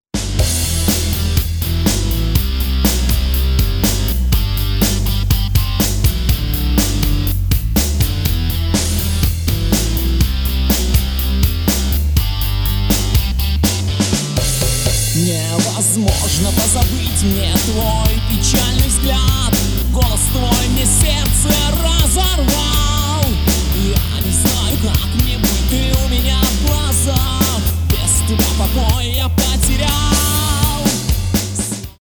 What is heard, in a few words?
Rock